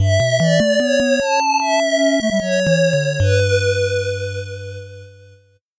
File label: 8bit jingle slow